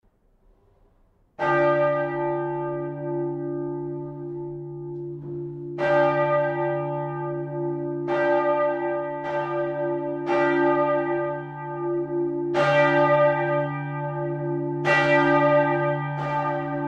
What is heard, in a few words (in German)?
Maximiliansglocke